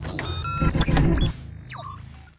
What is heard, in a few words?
Dog Cage Open